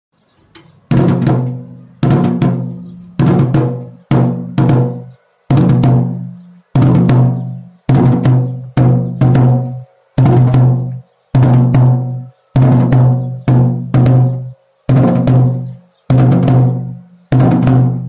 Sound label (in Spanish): Toque Silencio